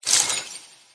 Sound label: Click Gem